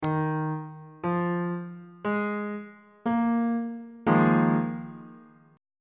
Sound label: b flat dominant 7th suspended 4th 1st inversion on bass clef